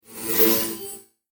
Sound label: Static Blast Data Transmission Radio Tuning; Sound Design; BBFX